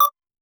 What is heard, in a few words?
ui sound for picking up; interaction